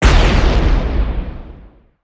Launcher Venom Cast; bullet

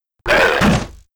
attack; SNATCHERBAIT; Mob

